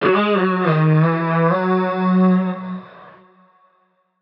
vocal radiance G#m; SD AURORA Sample Pack; Slate

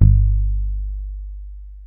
Bass one shot Muted G, Electric Bass; Slate Digital BASSHOLE Sample Pack

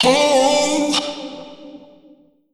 Omnivox oneshot Dm, Vocal; One Shots; Slate Digital Omnivox Vocal Sample Pack

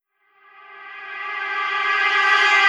reverse, vocal sample; Slate Digital Omnivox Vocal Sample Pack

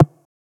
perc blip; Drum Sample Pack; One Shots; Slate; SEISMIC

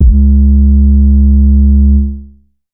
Synth oneshot Long C#, Synth Bass; Synth Bass One Shots; Slate Digital BASSHOLE Sample Pack

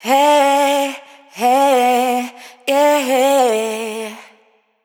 riff Hey Yay 90 Bm wet, Vocal; Slate Digital Omnivox Vocal Sample Pack

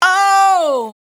Falling "Oh", adlib, Dry Vocals; Fm; Raw Vocals; Slate Digital Omnivox Vocal Sample Pack; Adlibs

